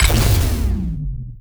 battery pack remove; mechanical units

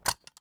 rotate weapon, ui; Counter Strike Model Pack; CS:GO; panorama

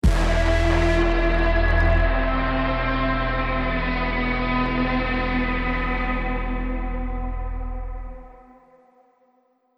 lost round, music

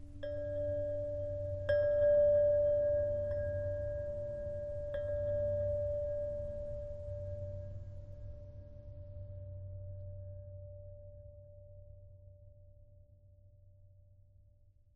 ambient abstract chimes low; Half Life Model Pack

